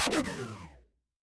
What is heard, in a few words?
retro game laser